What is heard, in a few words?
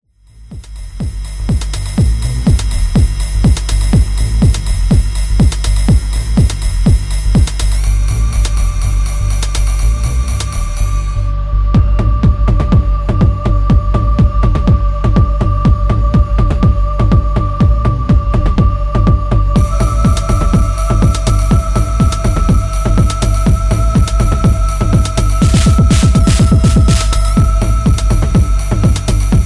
Voices in E Minor 909